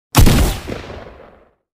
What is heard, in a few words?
Tac Shotgun Sound Effect; Fortnite